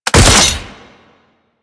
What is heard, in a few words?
Shot; Warframe; Grineer Ghouls; Ghoul Sawman; Attacks